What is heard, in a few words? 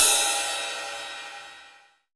Ride, Cymbals; Vengeance Samples